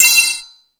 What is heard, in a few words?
Hip Hop, Drum Machines